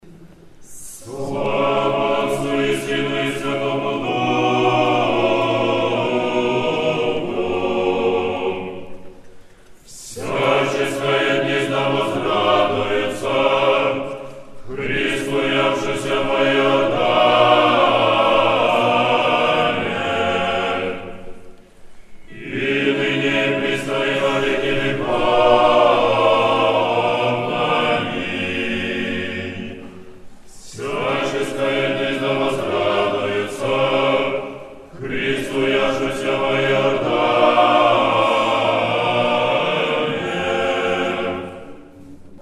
Hor Moskovskogo Sretenskogo monastyrya Svyatoe Bogoyavlenie Stihira po 50 m psalme